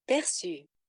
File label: percu